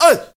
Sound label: MALE SOLO, MALE NOISE, Heart of Africa